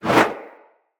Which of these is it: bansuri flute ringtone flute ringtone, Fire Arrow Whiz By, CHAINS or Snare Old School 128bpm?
Fire Arrow Whiz By